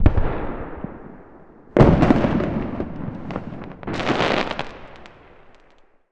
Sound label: Main Firework; NY19; World of Tanks Blitz; hangar ny 2018